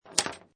Pinball Sound Ball Move And Hit Metal Object; Video Game Sound Effects; Lynne Music

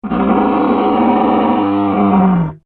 Dinosaur Bleat, Creature; Animal; Dinosaurs; Animals; BlastWave

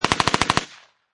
Machine Gun Uzi Model A Long Burst

